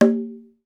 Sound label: CONGA, Roland; Hybrid Kits; Club-50 - Foundations; Thin